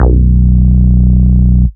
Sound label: Root B, Ultimate Bass; Vengeance Samples